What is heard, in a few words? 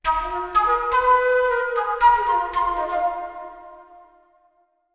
Flute Large Empty Chamber